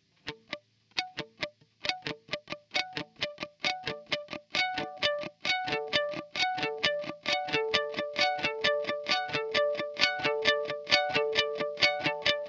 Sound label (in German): Silos Delay Digital Mix Max Repeats 3 Uhr; EQD